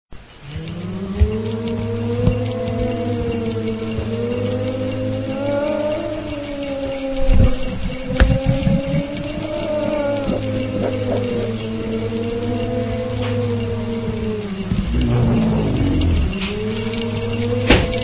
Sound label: Enhanced Spooky Moaning